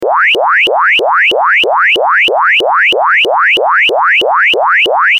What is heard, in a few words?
machinery alert siren